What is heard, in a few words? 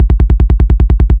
loop besa bass; guns tanks; War Thunder